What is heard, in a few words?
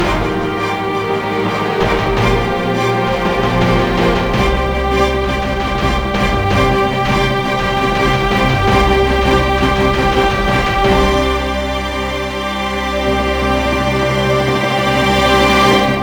More Strings